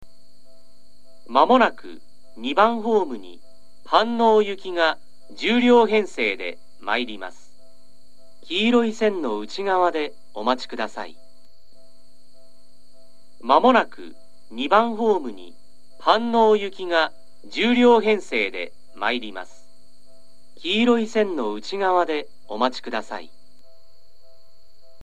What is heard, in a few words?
bushi hanno, seibu station